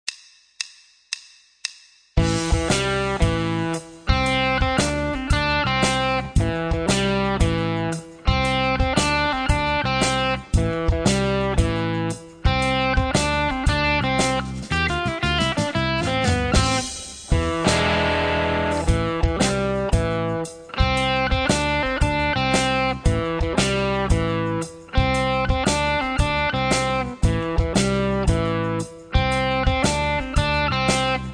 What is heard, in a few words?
Rock Playback, Rock & Blues Solos; De Haske